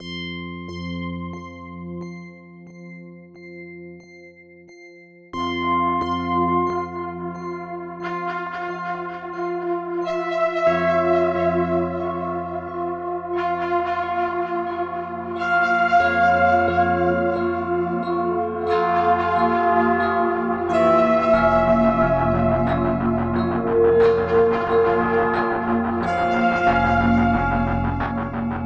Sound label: Hopeless atmosphere, Film music